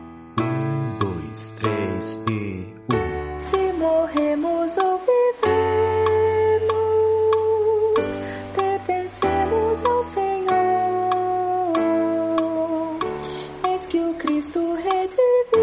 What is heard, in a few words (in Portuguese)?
contralto